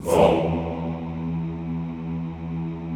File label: Classical Choir Hmmm E1; Best Service; Extended Classical Choir